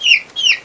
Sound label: wood goblin die